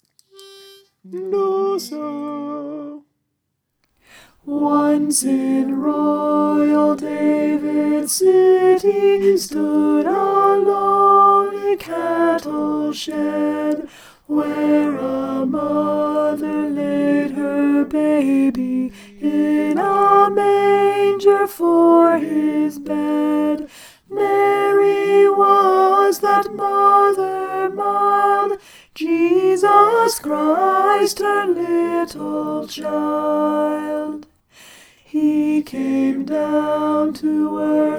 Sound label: alto